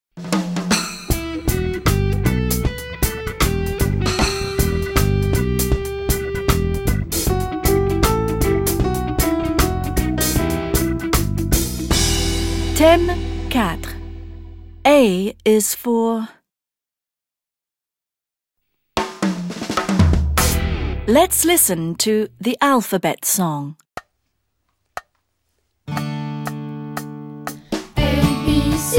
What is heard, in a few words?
alphabet song